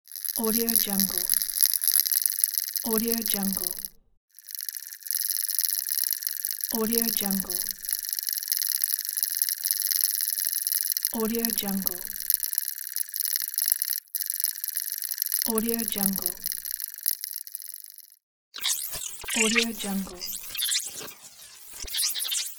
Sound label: futuristic noises elements